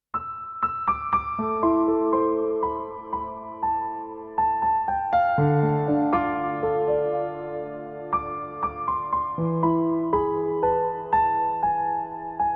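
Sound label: karaoke backing track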